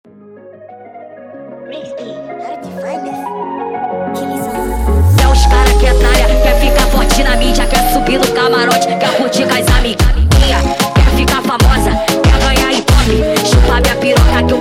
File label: Brega sped Up; funk